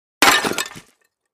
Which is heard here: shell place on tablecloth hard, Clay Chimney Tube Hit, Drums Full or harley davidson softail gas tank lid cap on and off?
Clay Chimney Tube Hit